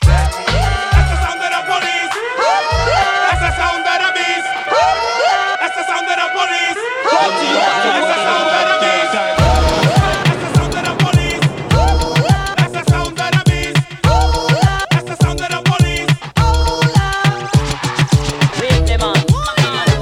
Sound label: Dancehall party